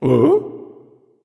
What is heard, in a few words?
nv confused; cupid